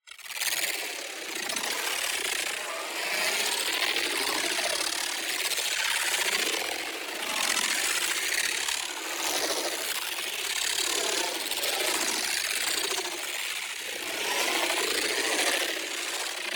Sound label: PARTICLES Insectoids Hometown; boom library; Matter